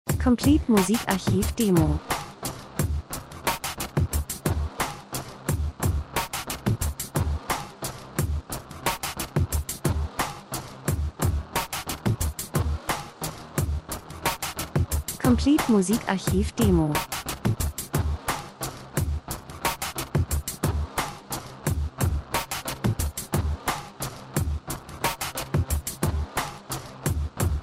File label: drums; Getragenener Rhythmus Snarre , Sounddesign Schwerfällig Massiv Menschen Maschine Industrie Dokumentationen